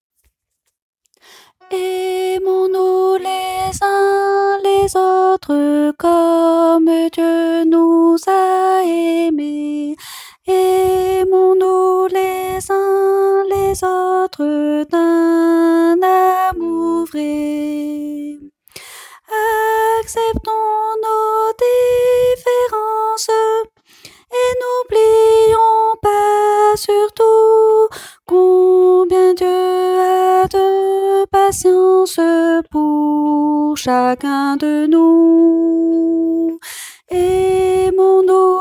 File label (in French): soprano